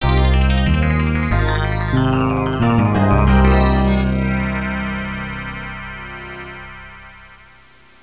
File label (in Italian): audio suonerie musica mistica